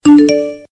apple sms ringtone

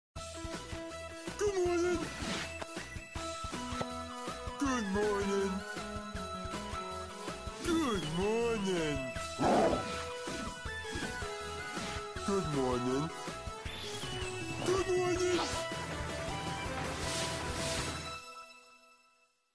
funny musical tone ringtone; uncle grandpa

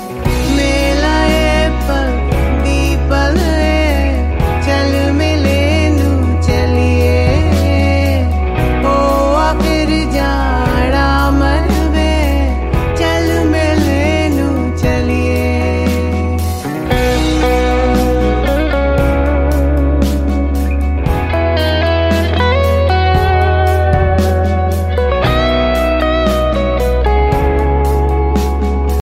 hindi ringtone, bollywood

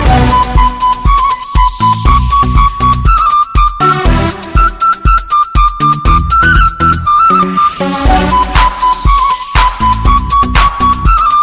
arabic house sound ringtone

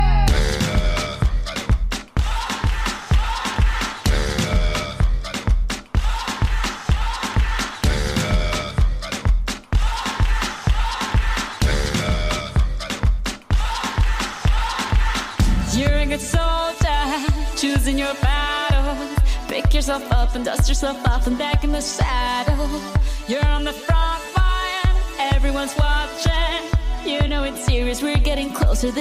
remix music ringtone; english ringtones

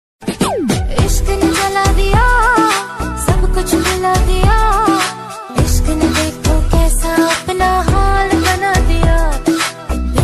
hindi ringtone

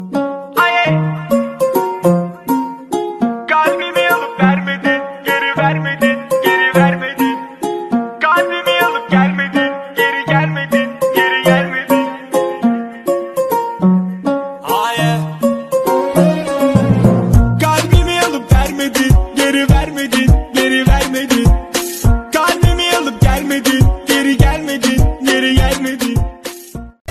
english ringtone

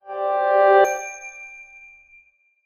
crystal ringtone, apple sms ringtones

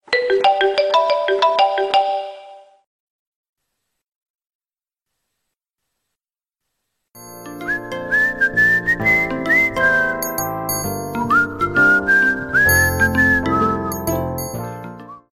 whatsapp message ringtone

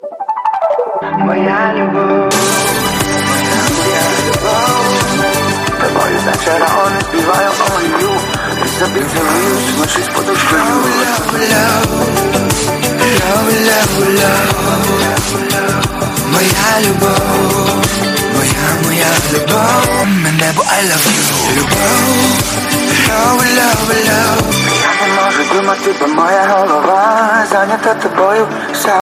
classical cool song tone ringtone

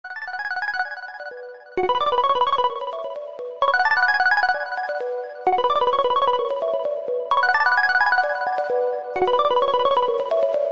iphone 6 plus ringtone

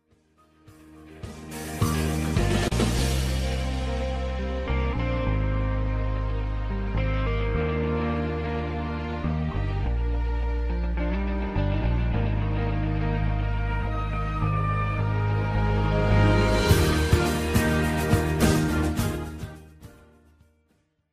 samsung s6 plus ringtone

